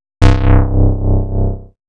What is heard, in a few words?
growl bass